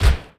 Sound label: cursor move; Totaled; XBOX